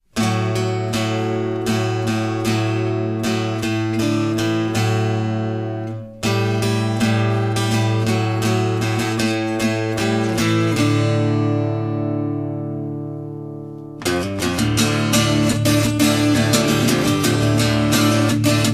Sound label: christmas celtic